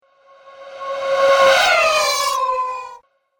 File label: Falling Gremlin Sprite, ghost sound effect; Ghost Sound Effects; Andrew Fleming